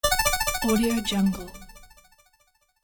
retro game level up extra life

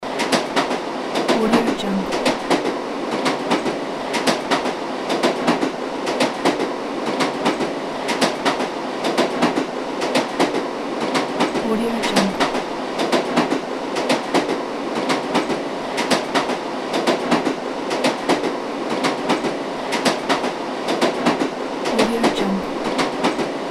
interior train loop

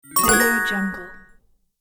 glitter coin

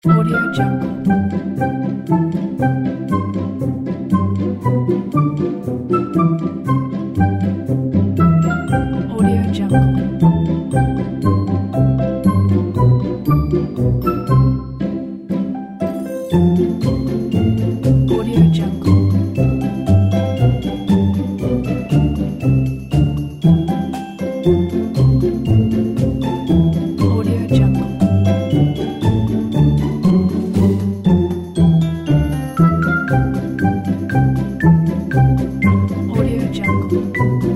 happy children music

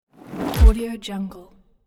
cartoon crunchy impact

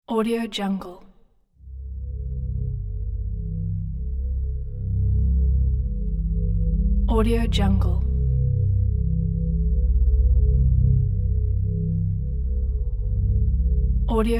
tension undertone

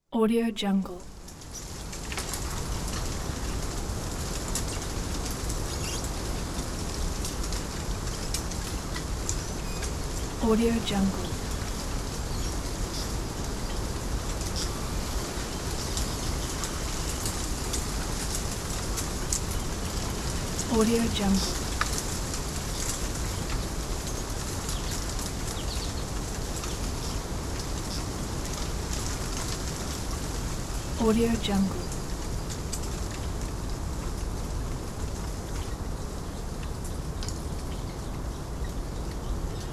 wind in forest

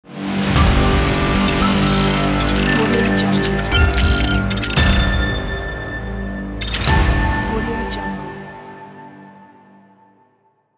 epic glitch logo

